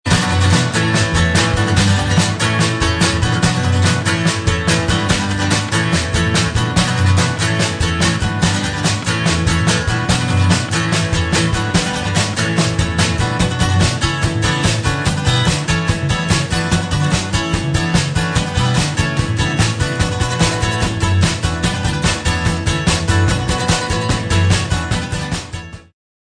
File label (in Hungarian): farizeusok dobszolos